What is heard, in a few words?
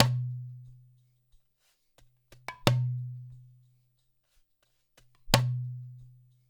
LOOP, Percussion; InVision Interactive; Percussion Slam; GRUNT